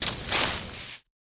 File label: pop up hide; Wild Ape